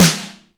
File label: DANCE SD, DANCE KIT